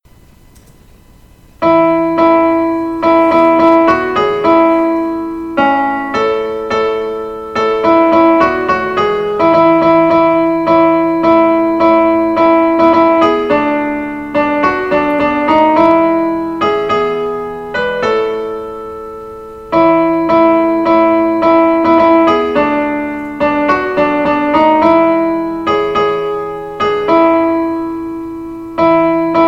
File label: piano alto